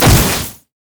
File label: virulent slash charged; LoL; skarner